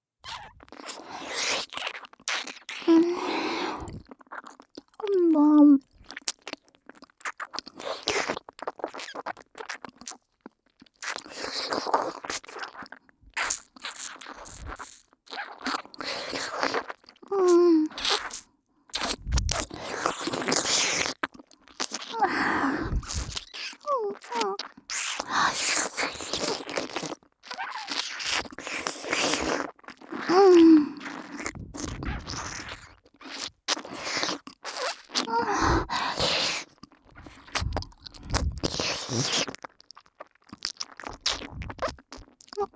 ねっとり深耳舐め（左）手コキループトラック, ASMR; スクールカースト上位の生意気JKを催眠分からせ調教

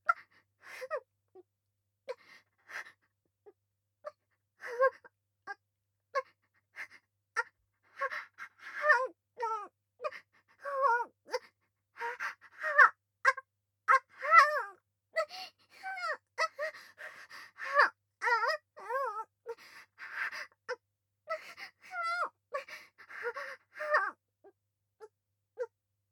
喘ぎ・普通; 淫乱メイド

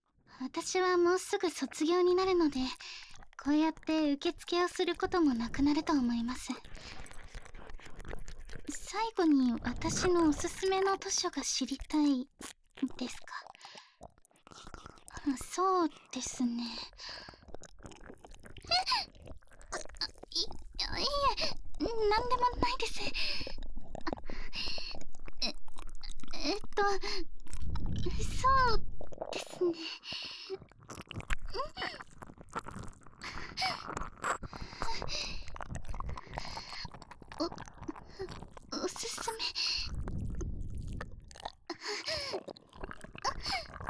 耳舐めされながら図書委員にクンニし、キスハメ・バック・騎乗位で中だしする音声; 押しに弱い図書委員長にえっちな無茶振りで彼氏にナイショの媚び媚びキス。地味JKと放課後オホ声生セックス

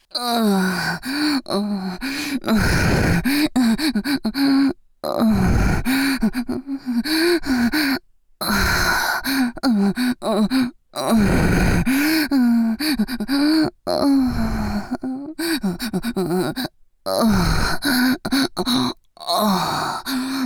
喘ぎ, ASMR; アナル舐め手コキ2連射; 左; ギャルフェラッ!!学校は援交ギャル風俗店ッ!!

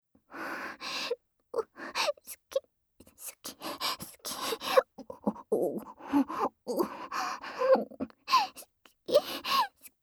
ご褒美しゅきしゅきガチオホ声ループトラック, ASMR; あなたの匂いが好きすぎる後輩チアJKとの媚び媚びチン嗅ぎご奉仕部活おわりえっち～モチベアゲアゲ↑↑動物交尾♪好き好き崇拝おまんこは先輩専用の生オナホです～

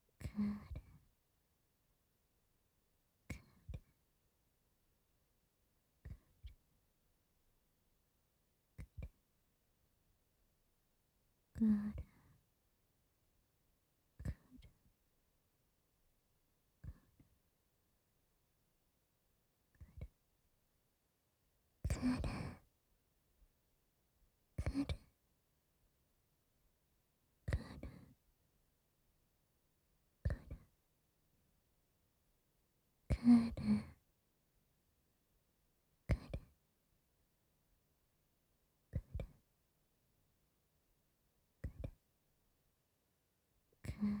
乳首弄りループ 乳輪周り

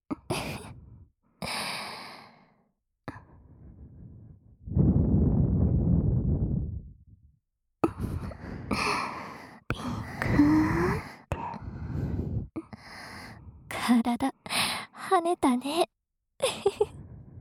両耳舐めローション手コキ, ASMR

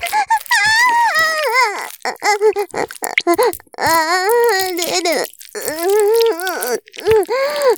絶頂 潮吹き; 騎乗位

